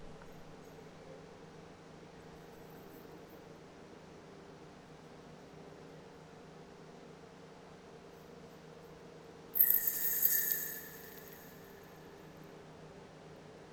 metal snake

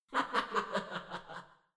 Laugh; Lust Princess

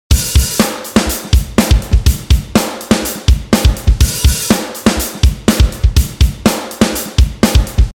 123 POP PUNK LOOP HAT SLOSHER

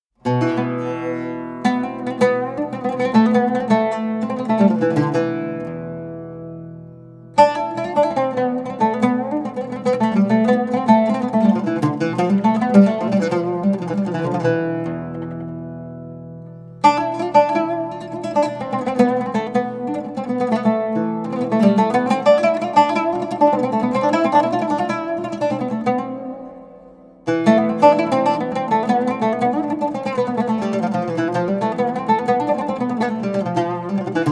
Kürdi Oud Taksim

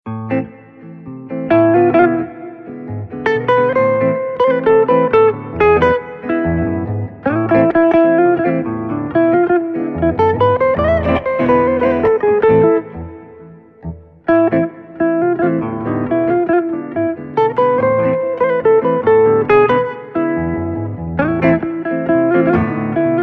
Tango in A Minor

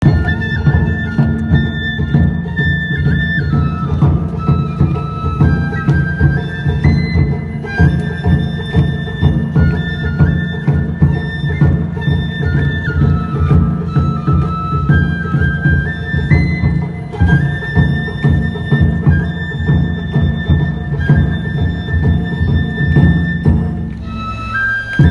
Festival, Taiko Drumming; Taiko Drumming at Kibikogen Festival; Okayama

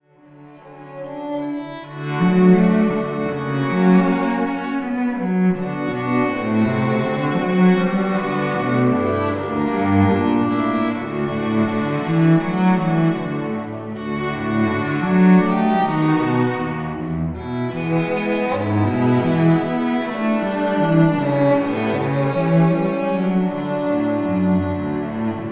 String Quartet; Wedding Music; Ann Arbor, MI; White Pines Entertainment

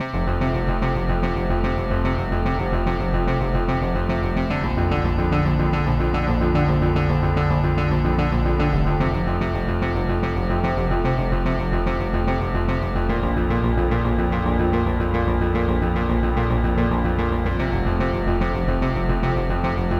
electro; archibald editions

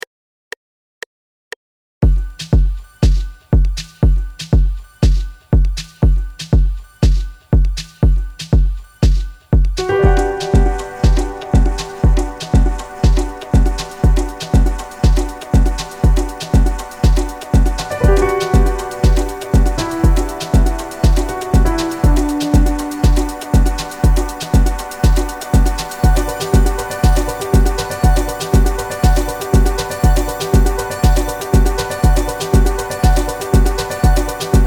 NO CLICK

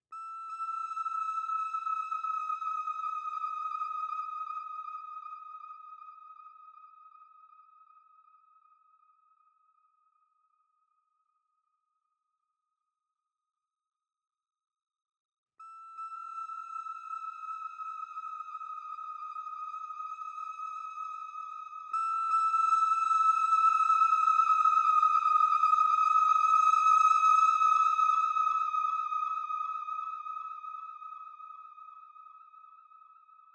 SIREN

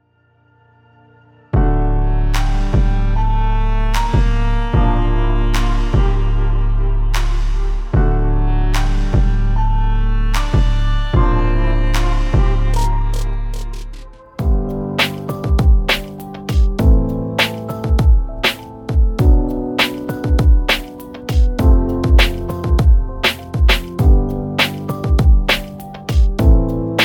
Inspirational Beats

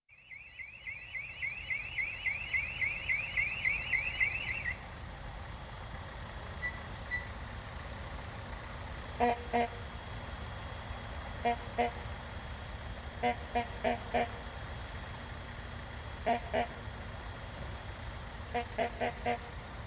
Horn Concerto

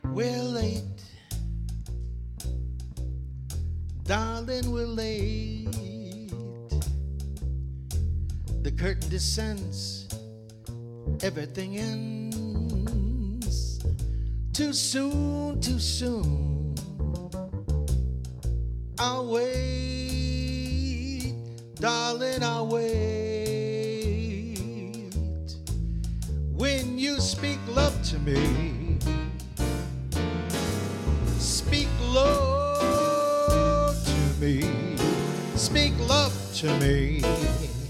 Quartet live

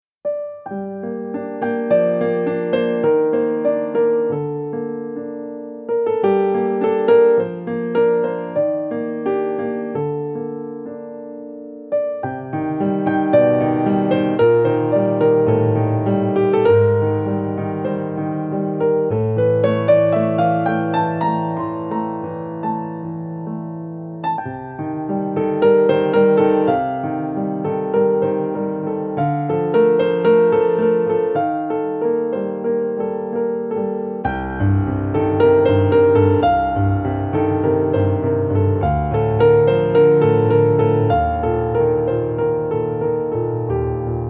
French Piano

